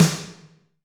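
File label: Snr Wet Aggressive, Alesis D4; 300 Drum Machines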